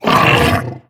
grunt unhappy, barnacle creatures; Half Life Alyx

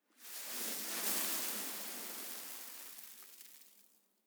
sand gust; dust2

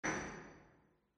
warehouse brick drop; Half Life Alyx; rocks

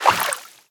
water entry; ambient

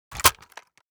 pickup weapon

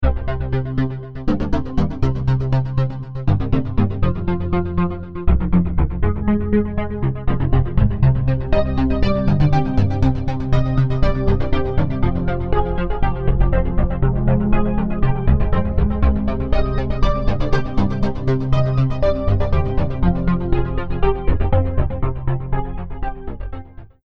start action, music

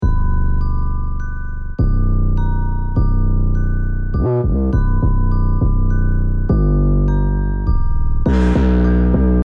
start round, music

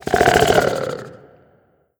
idle croak, barnacle; Half Life Alyx

